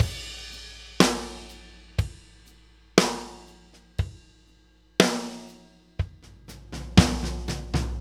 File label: New York Loop, Drum Tools, Laidback; Sonic Foundry (Sony Creative Software); NY Loops